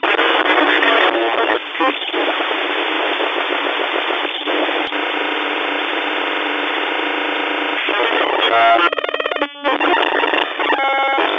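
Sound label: interference chaotic noisy distant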